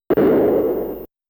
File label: Ground-based Enemy Stricken; Xevious